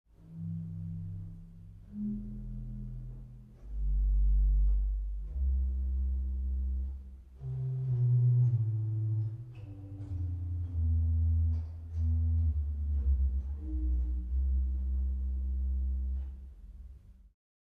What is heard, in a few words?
Subb 16; Schultheis Schlimbach